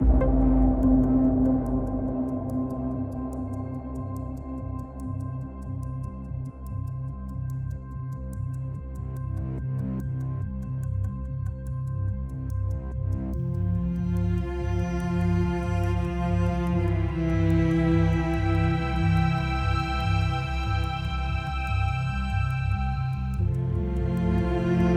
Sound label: Music Loading 72bpm; Forza Motorsport 6 Apex